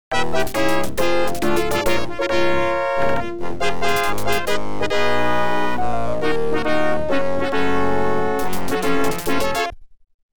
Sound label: OPL2; X WING